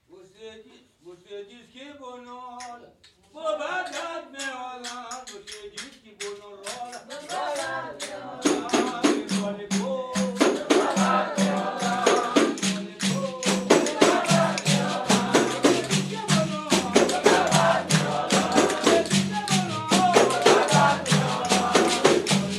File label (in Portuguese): fanti baiao de princesas